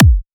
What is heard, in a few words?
Hardcore Kick